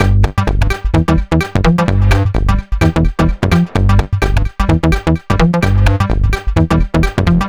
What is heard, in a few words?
128BPM Melody; Vengeance Samples; VFH1 128BPM Moonpatrol Kit